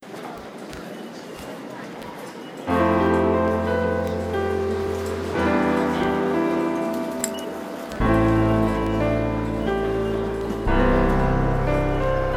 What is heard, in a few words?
LOFI OPENING